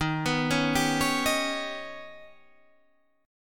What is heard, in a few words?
Eb Augmented 7th Eb down; arpeggiated; Eb+7